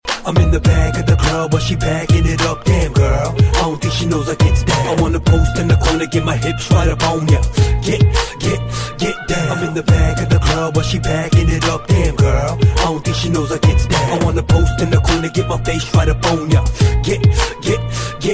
Arabic Hip hop Rmx

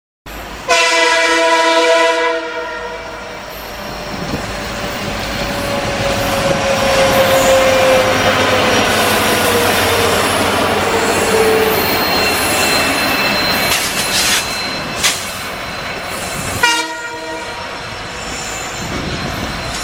Twins Horn; Wdp 4

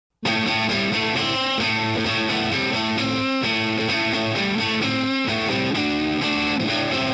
Cool Rock Sms

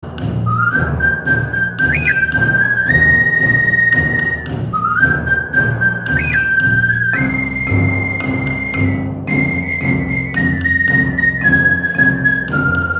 march; Barons

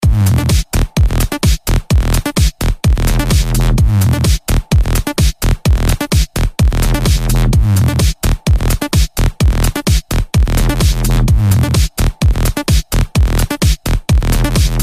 Shaker

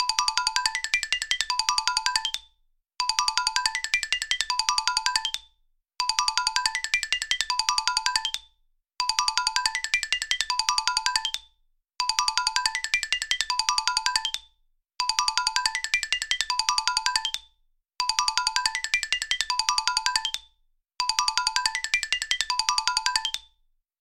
Alert

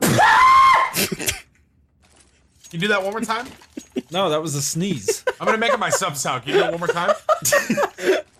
Spoon Sneeze

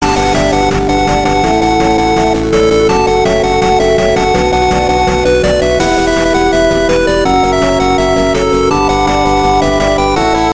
8bit; Shield Hero

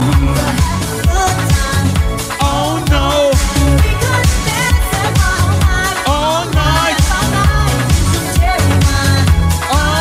Disco

